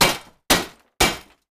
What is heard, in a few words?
smithing table; Minecraft; Votive World